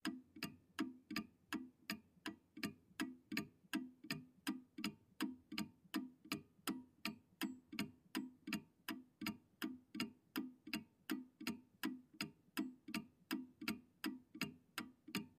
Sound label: General Clock Tickin